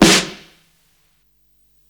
Snare cross, Psychedelic Drums

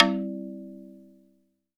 Tabla, Roland JX-305

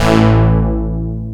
SYN ANA HIT, Dance Synth; Sound & Vision - Gigapack I CD 2 (Roland)

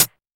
Closed HH

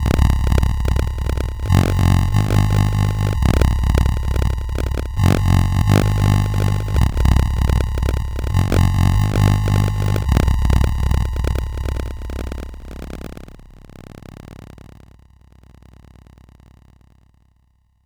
Ring Pop, Pads; KJ Sawka; Drum n Bass & Beyond

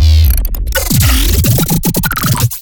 glitch stab; Virtual Riot; Glitch Stabs

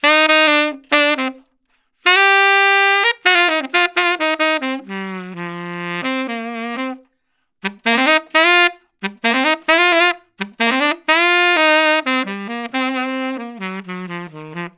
Tenor Solo, Jazz, Traditional, Tenor Sax

